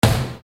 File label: Snare Dr